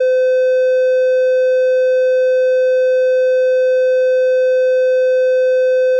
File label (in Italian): Terzo suono 512